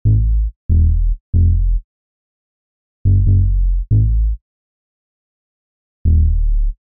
Bass; jetson

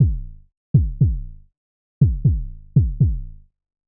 Kick

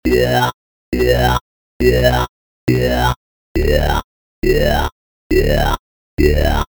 Lead; PatchFactory

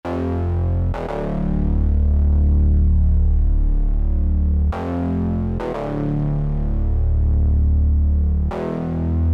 Bass; Whiplash

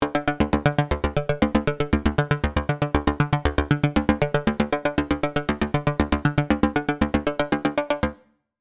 Arp; PatchFactory

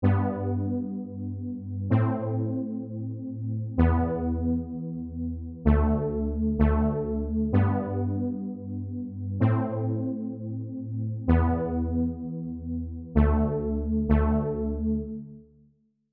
Brass; PatchFactory

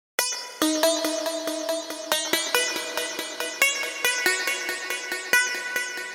Lead; The Cracken; Vesuvius

